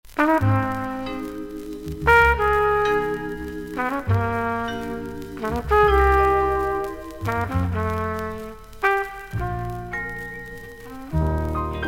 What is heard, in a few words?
jazz